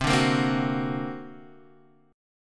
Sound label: D Augmented 7th C, guitar